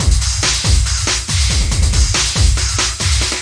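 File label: 140 bpm, deep future verb techno, drum loops and breaks; 131 to 140 bpm; samples-ghost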